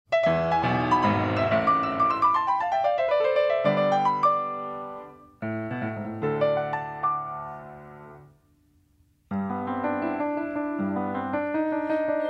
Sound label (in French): Chant final le soleil brille